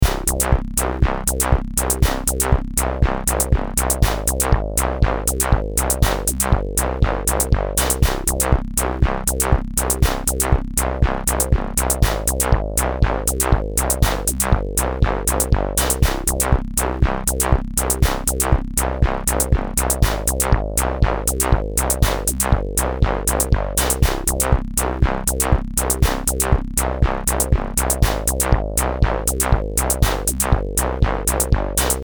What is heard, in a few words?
beat